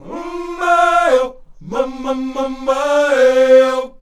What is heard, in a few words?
UM MAY, Afro Chants; Roland LCDP11 Africa VOL-1; Um May-O